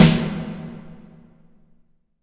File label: IMP SNR DRUM, Drum, Cinematic Impact; M-Audio Pro Sessions VOL-25; Liquid Cinema